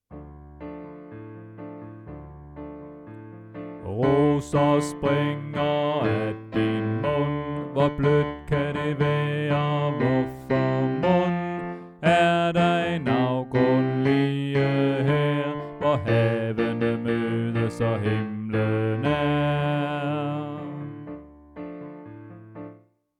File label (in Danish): Bas; oevefiler